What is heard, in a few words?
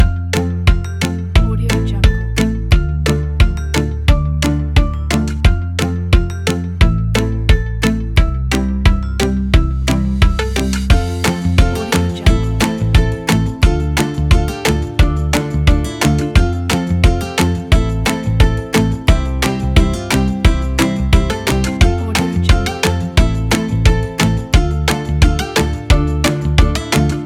playground fun